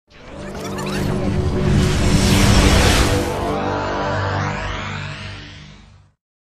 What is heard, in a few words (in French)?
Ambiance retour vers le passe